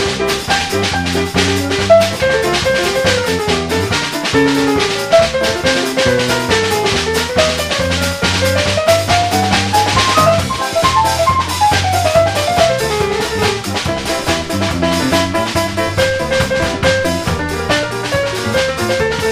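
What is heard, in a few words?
Fender P Bass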